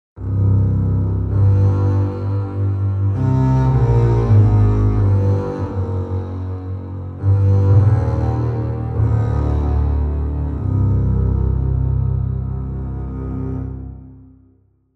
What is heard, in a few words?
Chris Hein Ensemble Strings Bass Small Dynamic Expression Long